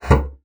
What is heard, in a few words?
bassoon slap; Xsample VOL-6; C BASSOON